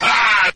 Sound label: "ha" SCRATCH; Voice; ooh ahh